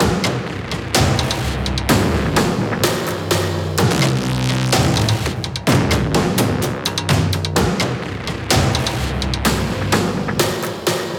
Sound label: Industrial Loop BPM127